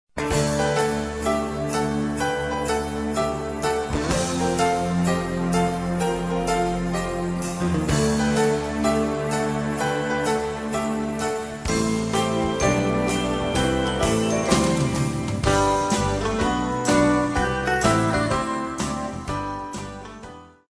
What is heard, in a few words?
Melody